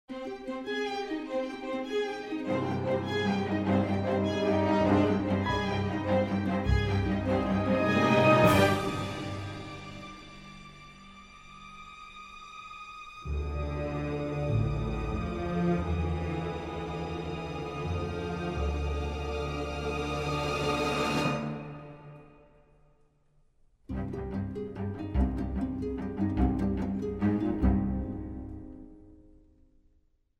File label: Music; Age of Empires III; cinematics